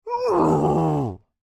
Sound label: Creature Speak Moan Upset, Human; Groaning; Voice; Big Room Sound